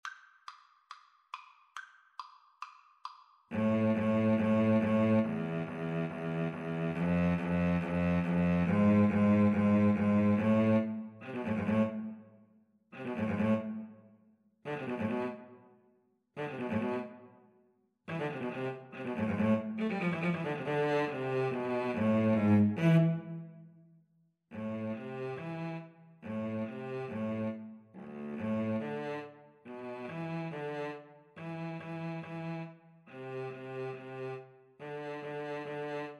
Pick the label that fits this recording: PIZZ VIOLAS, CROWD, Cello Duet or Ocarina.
Cello Duet